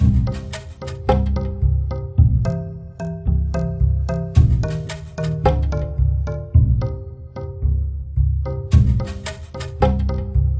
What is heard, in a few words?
Jungle Level